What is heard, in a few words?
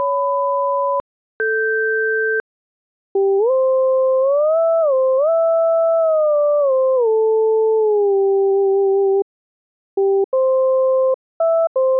gesture study, wavetable synthesis